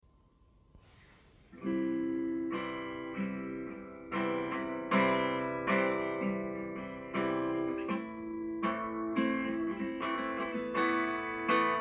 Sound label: unplugged